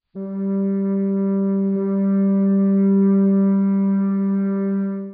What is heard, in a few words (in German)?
flöte g1 g; Intervalle, die man durch Potenzen von 2 und 3 darstellen kann